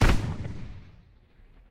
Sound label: aa fire small, guns